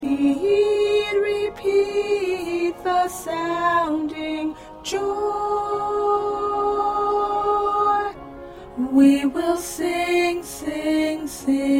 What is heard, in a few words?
Alto, choir